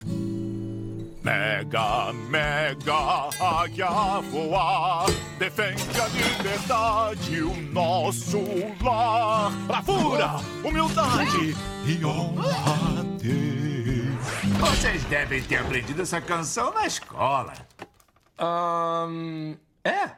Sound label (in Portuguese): Canção; Mega Águia; Angry Birds